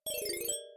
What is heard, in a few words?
Reset Button speed